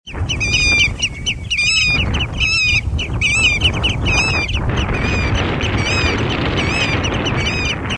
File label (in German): Uferschnepfe; Ruf